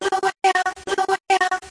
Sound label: voxy stutter, 140 bpm; REMIXABLE COLLECTIONS